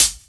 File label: Hhat, Hihat; Equipped Music; Loungin House